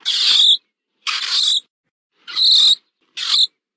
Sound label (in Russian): krot